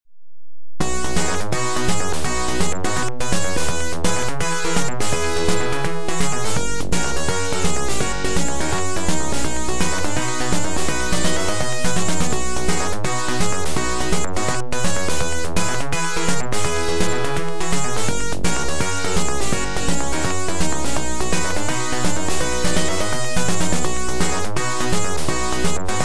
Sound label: tune; tmnt2 pc